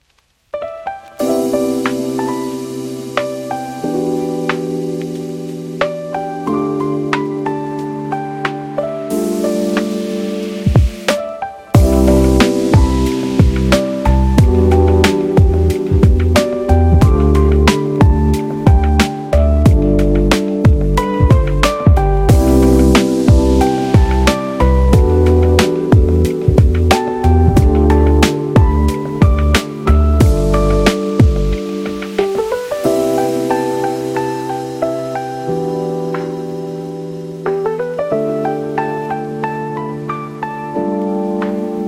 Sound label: Lofi